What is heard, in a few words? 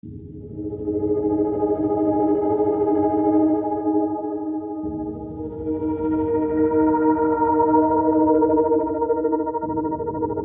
Mountain Breeze Flutter Layer